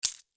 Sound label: coin